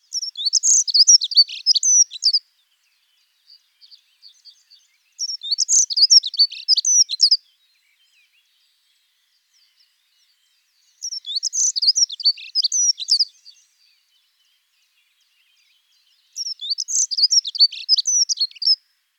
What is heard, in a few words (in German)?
Heckenbraunelle Prunella modularis